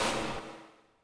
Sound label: steam Jet end; vehicles